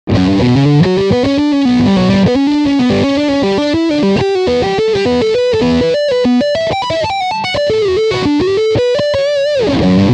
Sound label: Lick Front Pickup